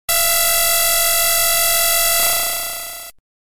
buzzsaw

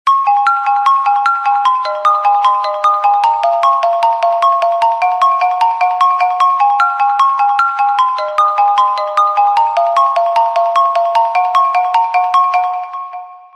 breeze

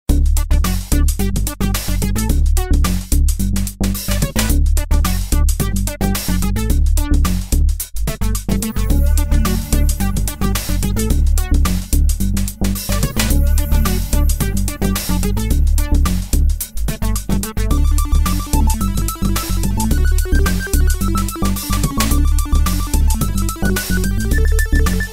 8 bit music

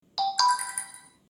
meteor

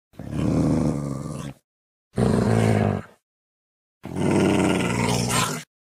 growl

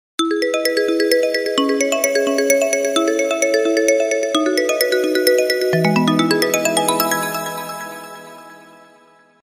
fairyland, itel

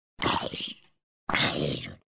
hurt

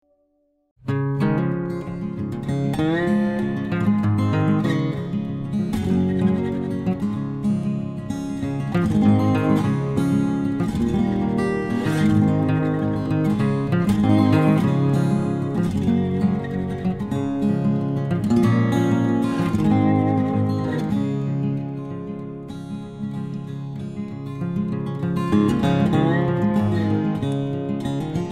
western

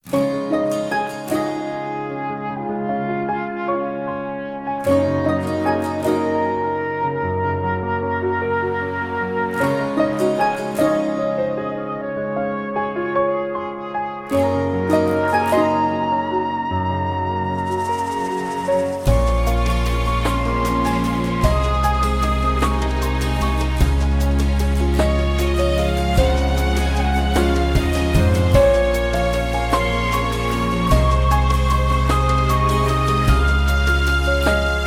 zvuki samsung touchwiz

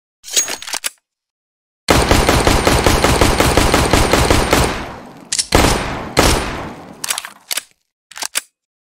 glock 18 csgo, opuzhyia

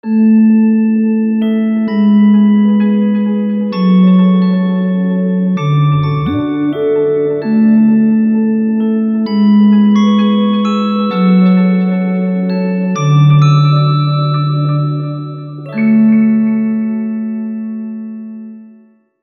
dreams, zvuki telefona realme

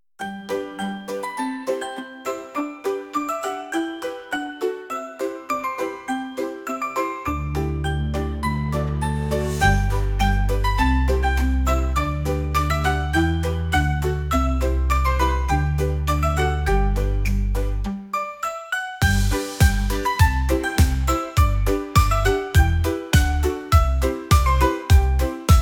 happy school, schastlivaia detskaia muzyka

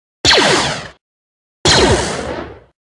blaster; imperial

